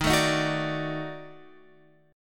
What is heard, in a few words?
D sharp Minor Major 7th Flat 5th, Guitar Open F; strummed